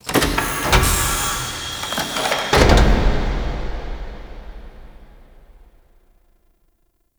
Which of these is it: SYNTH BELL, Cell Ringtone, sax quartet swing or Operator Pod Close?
Operator Pod Close